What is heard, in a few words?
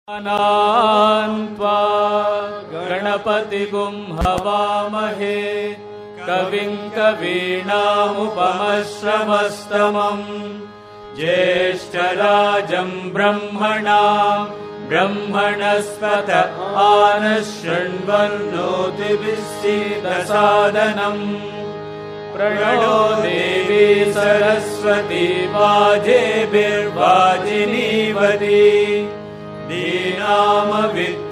Vedam Chant, Bhajans; Retreat September 2006; Saturday PM